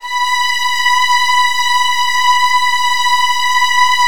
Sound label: B3, Strings; ILIO; Kirk Hunter Virtuoso Strings VOL-3; STR TAME